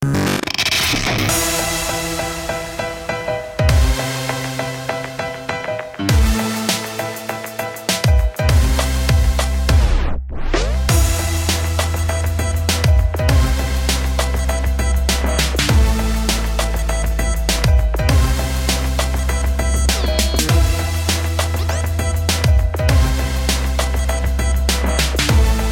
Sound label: Bed Hip-Hop; PACK BVO BEDS